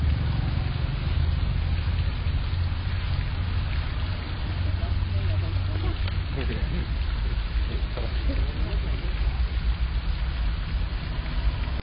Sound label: water